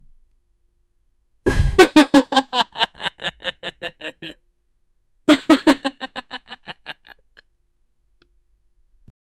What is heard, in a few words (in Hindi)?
ha ha ha karke chokne, giggle